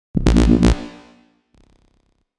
Robo fart

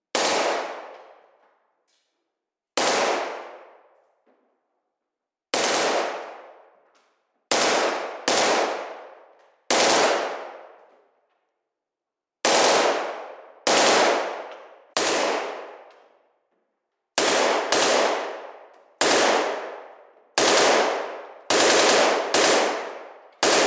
Kalashnikov Machine Gun Shoot Inside

